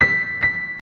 modern piano futuristic e

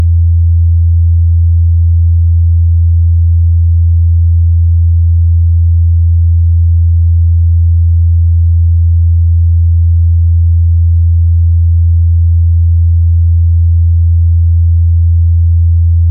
40 hertz frequency

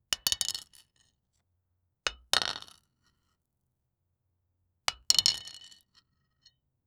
asmr knife cutting stone

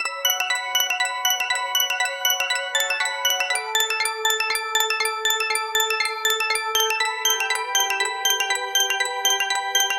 make a short musical them; theme

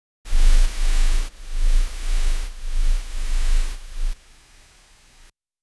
camera shake effect exact

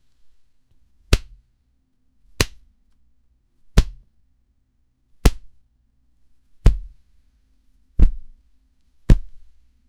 several dynamic hit editi

